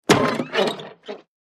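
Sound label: wild west sounds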